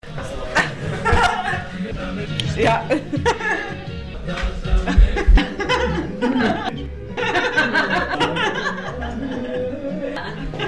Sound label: laughs